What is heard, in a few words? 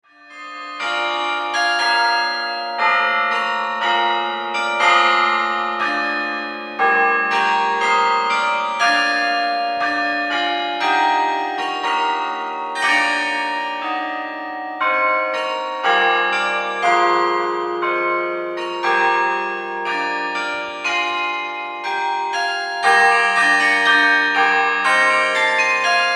carillon; a dramatic toccata-style conclusion; Range: 4 Octaves; Publisher: SMP